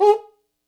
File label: kit, Yamaha Motif-ES8